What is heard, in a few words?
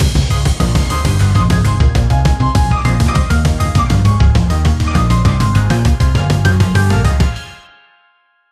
fast beat escape music, tense